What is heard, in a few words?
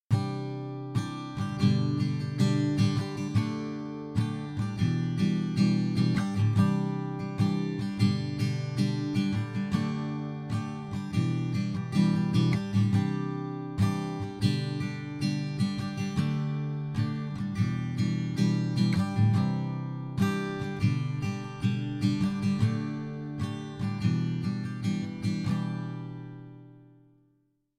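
P87 strummed guitar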